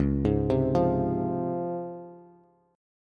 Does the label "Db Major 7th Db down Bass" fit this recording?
Yes